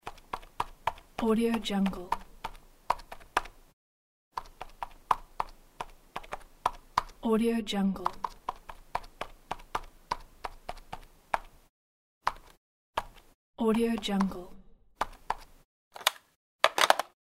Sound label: landline phone